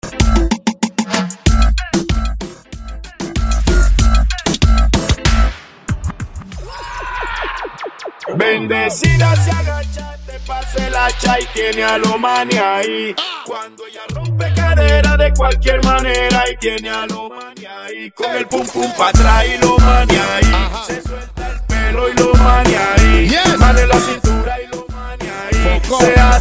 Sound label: intro breakdown 95 bpm; Prodeejay Remix